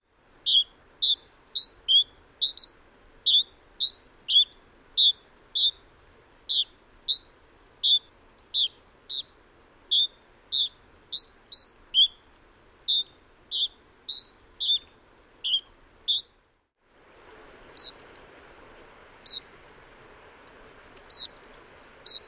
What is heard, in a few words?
Canyon Wren calls